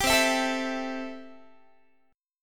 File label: C 6th, Guitar; strummed; 22,20,19,19,20,x